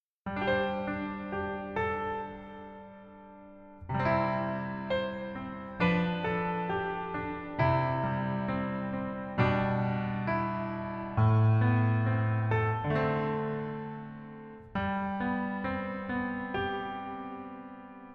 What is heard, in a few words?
Piano ver